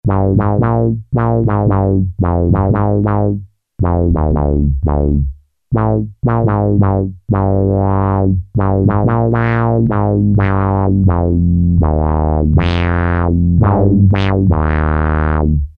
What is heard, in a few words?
Bass; Cheetah MS6